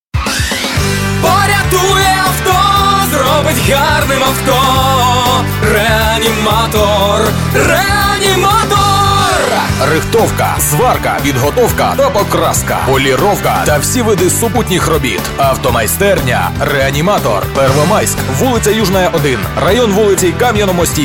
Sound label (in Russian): Реаниматор (Vocal)